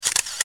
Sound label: shutter, phone tones; LG GD570 dLite